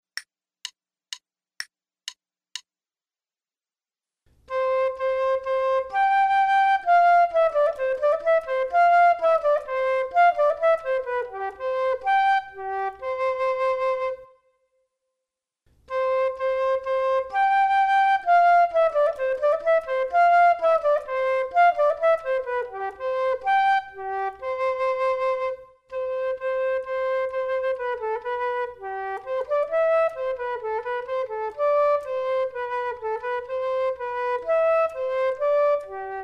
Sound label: Duets flute; Selected Duets for Flute Podcast